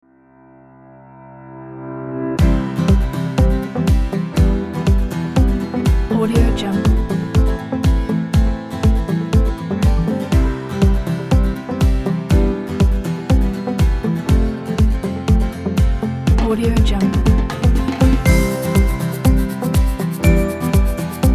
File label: uplifting inspiring summer